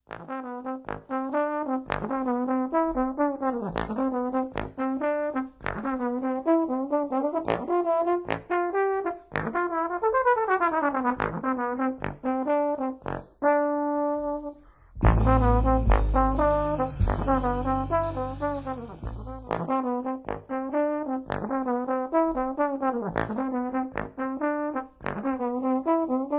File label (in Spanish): SONIDO DE TROMBON